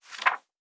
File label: Book Page; Survival Game Sounds - Lite Edition; Objects and Items